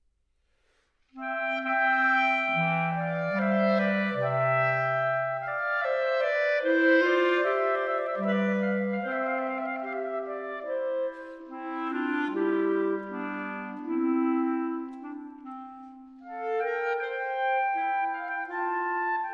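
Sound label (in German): Ouvertüre Larghetto, clarinet; clarinart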